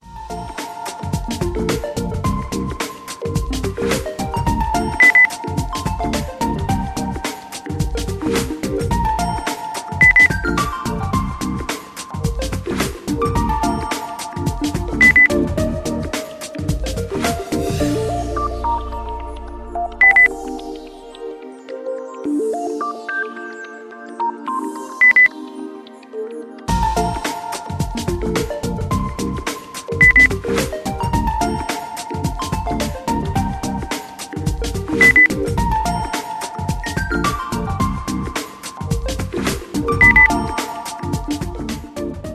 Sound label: lounge